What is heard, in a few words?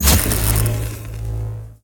wrong target